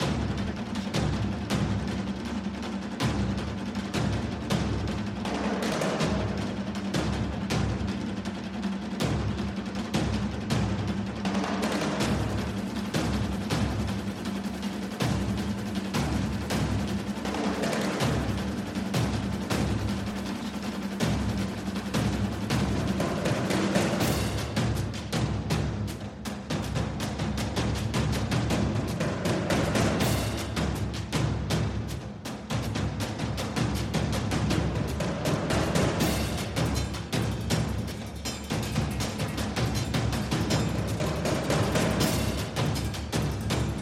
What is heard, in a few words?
war beat; soundscrate